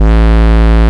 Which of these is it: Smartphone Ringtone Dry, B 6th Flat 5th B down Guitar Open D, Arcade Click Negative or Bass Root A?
Bass Root A